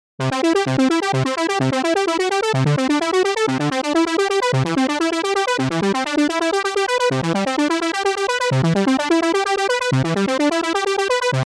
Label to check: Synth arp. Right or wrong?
Right